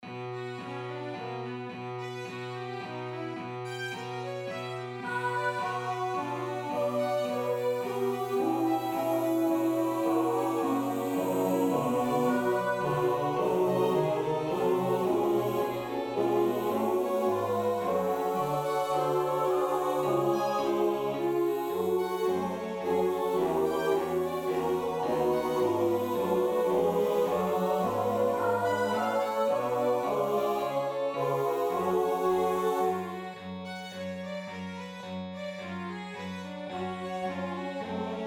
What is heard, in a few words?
Et incarnatus est synth